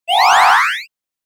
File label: Magic Fx Video Game